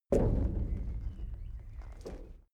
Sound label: step metal step; schritte